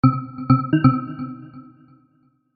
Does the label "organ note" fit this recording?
Yes